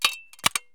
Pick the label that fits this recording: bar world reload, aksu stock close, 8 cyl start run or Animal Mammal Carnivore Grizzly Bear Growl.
aksu stock close